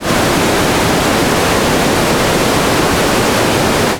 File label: Noise White